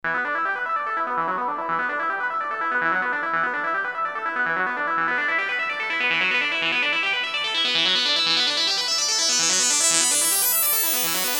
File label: Pulse Cuttoff